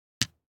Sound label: DICE on Felt, Drop, Standard, 3 Three Dice; Samples; ArticulatedSounds; DICE Sfx Party (Extended)